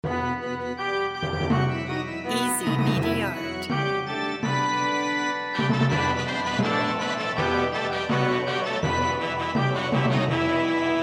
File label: lullaby; old king cole